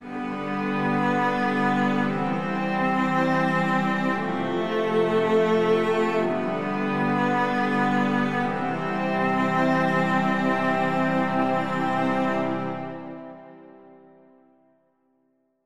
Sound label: Solemn Outro